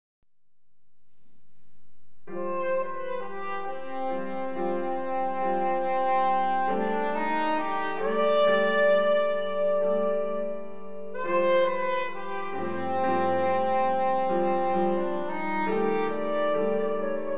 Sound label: Soprano Saxophone And Piano; Jazz Composition; Jazz Composition Showcase 1998; CLCM Students